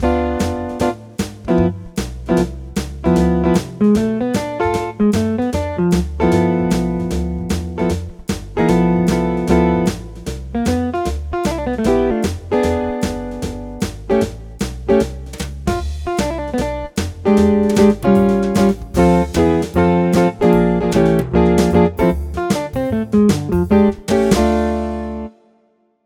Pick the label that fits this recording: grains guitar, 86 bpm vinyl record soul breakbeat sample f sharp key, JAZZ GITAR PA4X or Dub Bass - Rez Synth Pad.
JAZZ GITAR PA4X